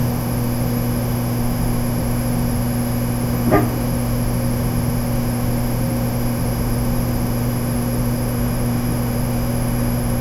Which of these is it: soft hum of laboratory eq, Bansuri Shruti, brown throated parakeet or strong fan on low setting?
soft hum of laboratory eq